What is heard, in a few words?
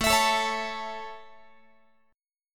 A Major A, Guitar; strummed; x,17,19,19,17,20